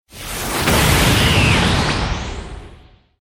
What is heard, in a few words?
dummy power up; the strongest battlegrounds